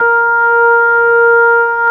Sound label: ORG B3, organs; Sound & Vision; Gigapack I CD 2